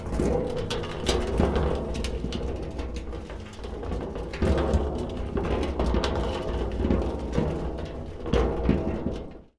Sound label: drum roll